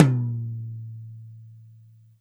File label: 8 tom; E-MU Producer Series Vol. 7 – Old World Instruments